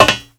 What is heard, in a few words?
foot metal, footsteps; Call of Duty Modern Warfare